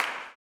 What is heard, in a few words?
CLAP, Blues Soul; Spectrasonics Vocal Planet